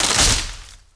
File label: tree snap; impacts explos